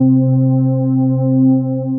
Cassandra Pad; Ableton; Factory Packs; Punch and Tilt

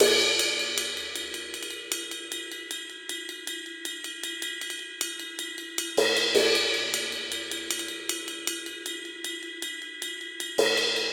Straight Reggae Cymbals Only 78 bpm; 4 4; MIDI Clips; Ableton; Factory Packs; Drum Booth

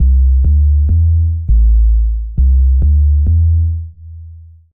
3 OP Boom groove 101 bpm; MIDI Clips; Ableton; Factory Packs; Beat Tools

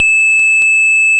Lead E6; Ableton Foundation FreePacks; Foundation Glitch Toys & LoFi

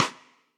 Snare Sidestick; Drums; Drum Hits; Standard Hits; Reso Classic; Ableton; Session Drums Club

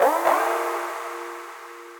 Effects; Climbing Operation

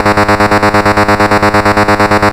Pitfall Trem G#1; Ableton Foundation FreePacks; Foundation Glitch Toys & LoFi

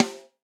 Snare Rim Steely Tight; Drum Hits; Standard Hits; Ableton; Factory Packs; Session Drums Studio

